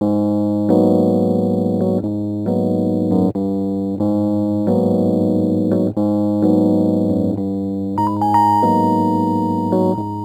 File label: Rhodes Attacks; Ueberschall